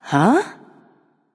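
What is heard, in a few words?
confused, female; smite; voicepack; hera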